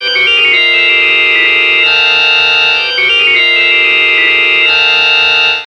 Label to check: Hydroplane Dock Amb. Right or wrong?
Wrong